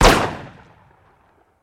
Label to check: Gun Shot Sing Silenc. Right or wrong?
Right